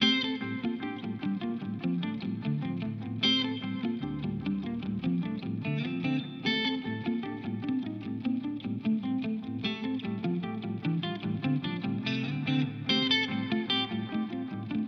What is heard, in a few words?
MXR Carbon Copy